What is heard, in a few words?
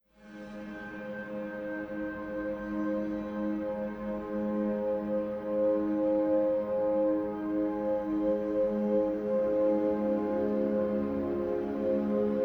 Massage Music Asian Zen Meditation Soothing Soul